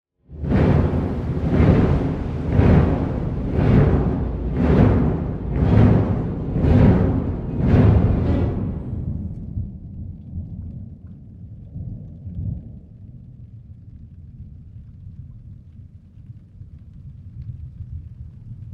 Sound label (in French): coup de tonnerre timbales; Berlioz